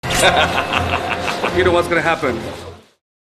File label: Stupid Laugh